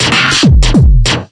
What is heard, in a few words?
superdistort offbreak, DRUM LOOPS and BREAKS, 181 bpm